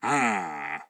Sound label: Blacksmith Action; Folk Hero